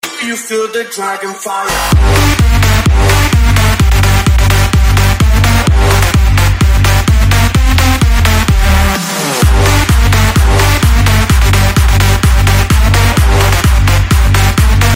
Insane Techno

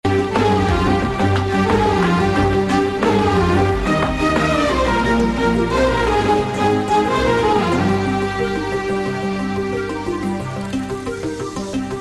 Love Music; Nadodigal

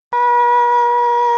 scream

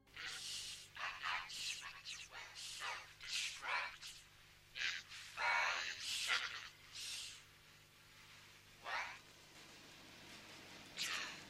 Self Destruct Warn

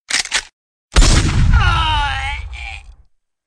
Shotgun; Death

